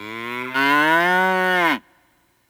bull; Corrida Romance Deluxe; wazdan

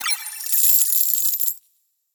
collect money; Beauty Fruity; wazdan

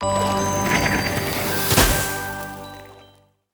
wizard; Bell Wizard; wazdan

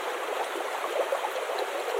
mouseover water; gamble; DoubleTigersWD; wazdan

